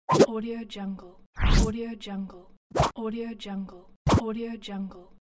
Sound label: pop up slide